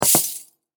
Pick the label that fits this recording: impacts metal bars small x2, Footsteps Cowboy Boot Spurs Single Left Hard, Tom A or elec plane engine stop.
Footsteps Cowboy Boot Spurs Single Left Hard